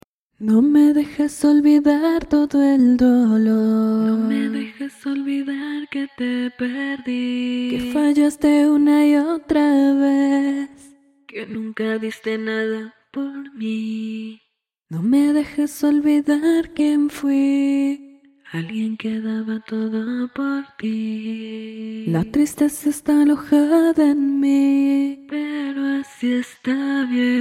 pop 133 Bm, Acapellas

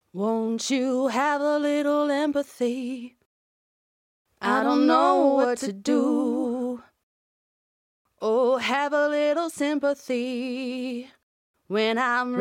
pop house dance 128 BM

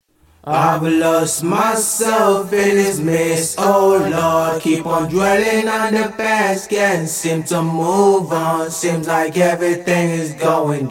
indie 115 Em, Acapellas

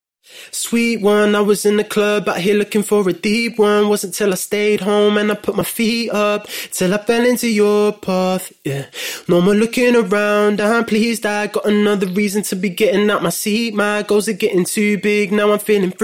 pop rap sing 94 FM

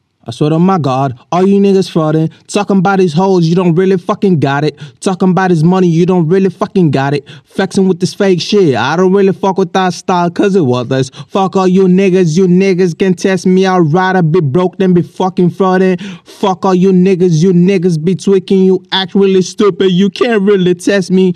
hip hop, 130, Gm, acapellas

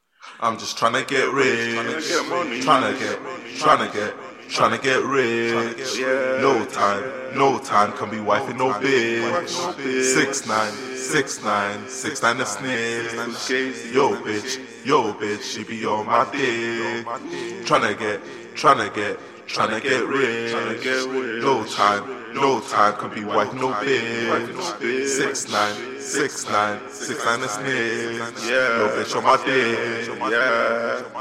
Vocal trap 128 Fm, Acapellas

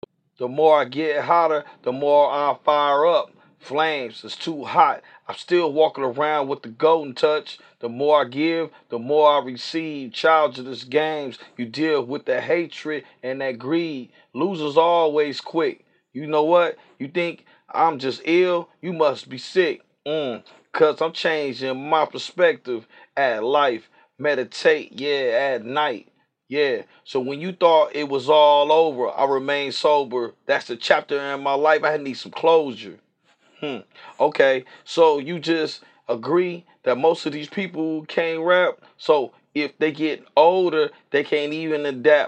hip hop 108 A sharp, Acapellas

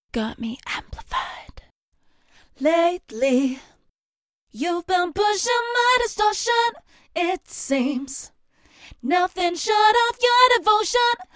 Pop EDM 107 Fm

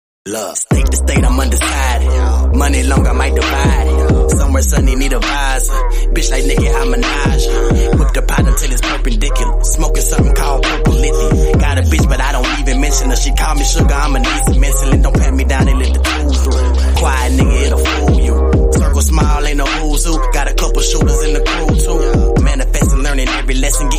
Remix, hip hop/rap

